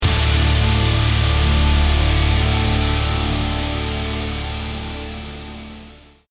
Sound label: deathcam